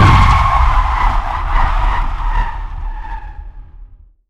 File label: Aircrafts Gear Hard Touch; DCS